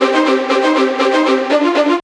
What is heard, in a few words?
music string; Samsung GT-i7680; cmcc